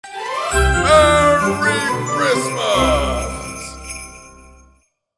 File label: Magical merry christmas festive celebration